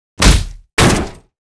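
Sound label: Mob attack; SubBossmob; 욱일승천류; 사부로; 2단계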